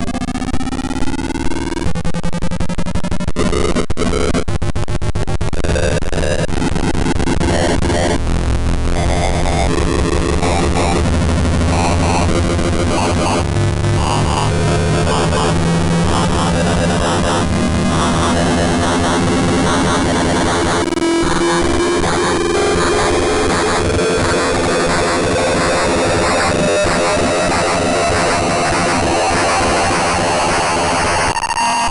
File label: Noise